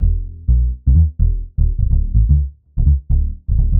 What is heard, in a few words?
126 E D BASS, Funky; Loopmasters; Funky Underground Vol 2; BASS LOOPS